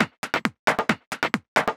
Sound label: Groove 135; Dance Mega Add On Grooves